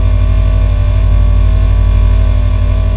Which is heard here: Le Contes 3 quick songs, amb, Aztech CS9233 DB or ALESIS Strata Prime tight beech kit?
amb